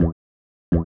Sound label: HOUSE FX 125